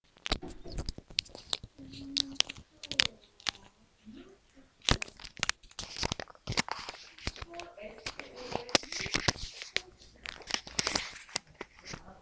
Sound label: glass